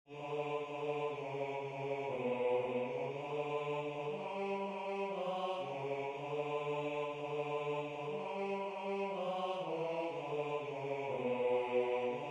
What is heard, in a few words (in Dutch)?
heilig de god van alle leven bas